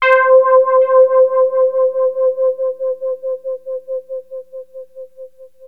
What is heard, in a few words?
C5, Korg MS-2000; Synthworx